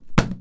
Book Blow

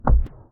Swooshing Punch

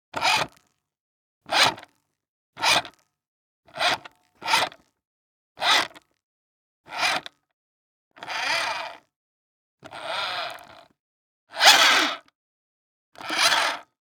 Servo Motor

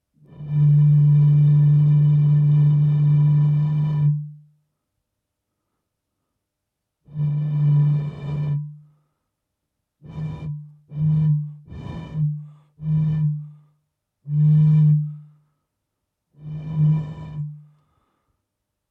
Blowing On Bottle

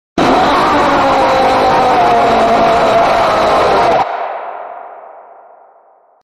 Jump scare Sound

